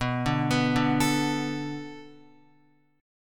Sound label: B Minor 7th B Guitar, chord; Bm7; arpeggiated; JGuitar